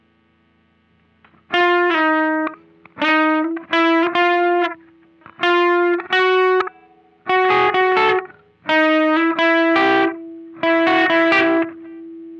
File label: Riff Slow